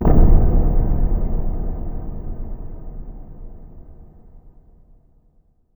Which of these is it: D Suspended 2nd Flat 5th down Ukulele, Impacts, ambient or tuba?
Impacts